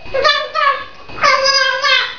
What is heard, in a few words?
multi wabble, parrots